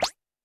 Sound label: Roland TD-20 Percussion